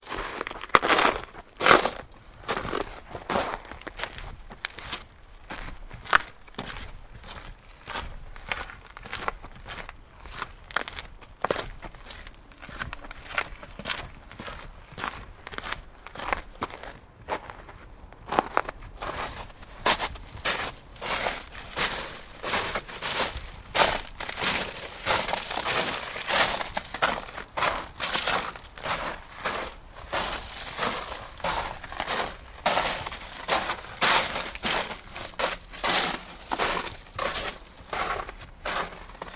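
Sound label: sounds of footsteps on ice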